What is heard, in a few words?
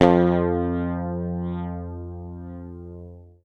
PICK, Bass Library